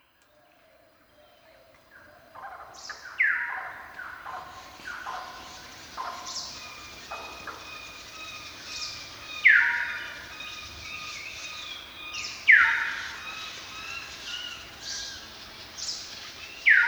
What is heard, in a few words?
Forest Ambience